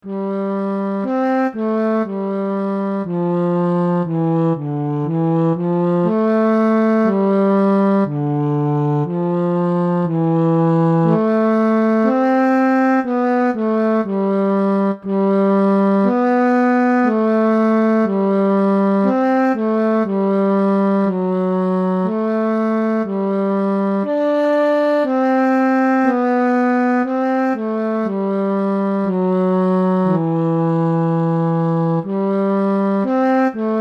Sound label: flute, hymn